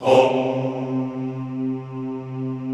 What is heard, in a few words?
HOMMM C2, Extended Classical Choir; Best Service